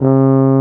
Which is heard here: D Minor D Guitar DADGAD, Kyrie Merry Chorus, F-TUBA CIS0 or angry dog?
F-TUBA CIS0